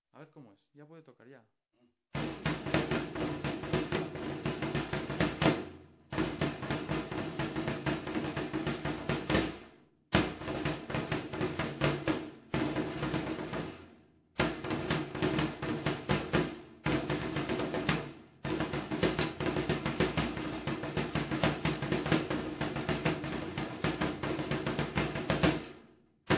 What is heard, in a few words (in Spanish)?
palillera, tambores; Alcorisa